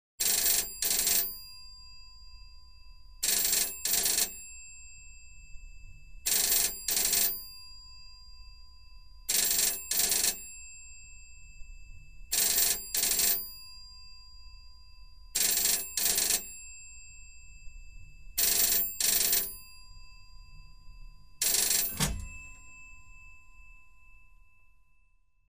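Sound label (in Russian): звонок старого телефона поднимают трубку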